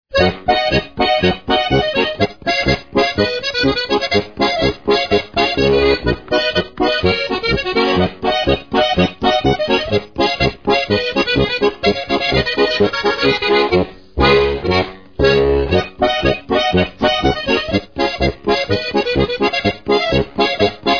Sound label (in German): Steirische Harmonika